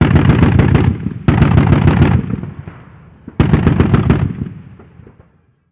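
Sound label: gun assault medium; Call of Duty Modern Warfare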